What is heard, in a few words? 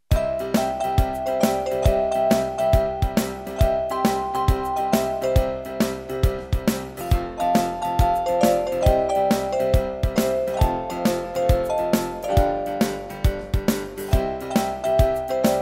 Jingle Rock Shuffle